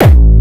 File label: Nu Style Kick, Kickdrums